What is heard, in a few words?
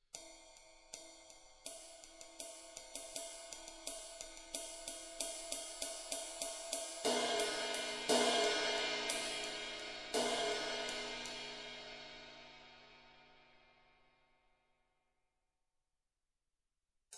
Ride Crash HiHat